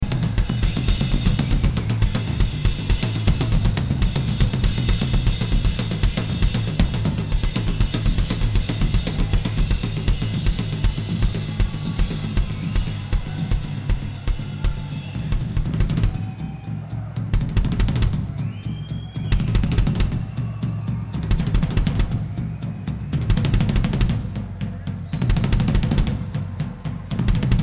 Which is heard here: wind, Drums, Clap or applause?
Drums